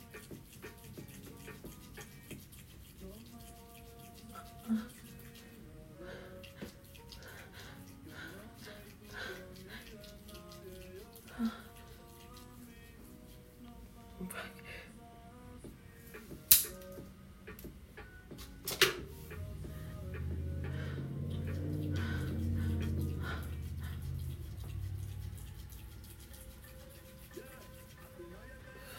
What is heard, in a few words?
whimpering, Spitting, Wet Noise; F4M; Early birthday treat